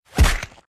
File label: kick sound effect